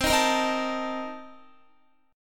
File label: C Minor 13th C Guitar; Cm13 strummed; JGuitar